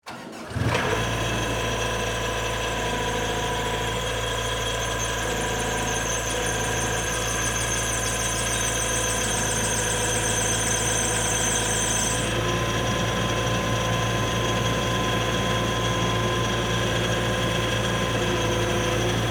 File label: Lawn Mower Run, Groundsmaster, 455-D, Start, Off, Golf, Course; Toro; BlastWave